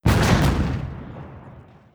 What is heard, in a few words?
heavy cruiser attack; Hearts of Iron IV Death or Dishonor